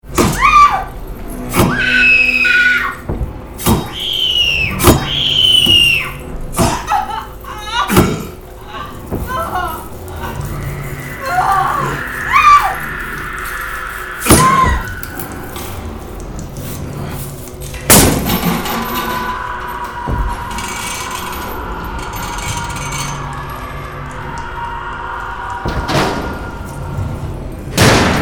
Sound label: ambient